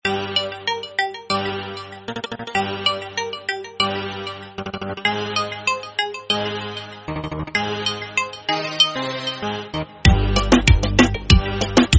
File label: Reggaeton